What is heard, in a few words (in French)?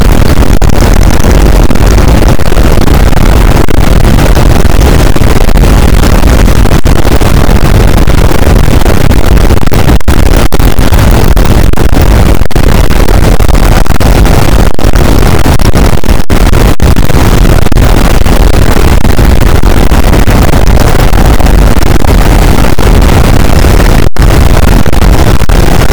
cachalot negatif, boat, Physeter Macrocephalus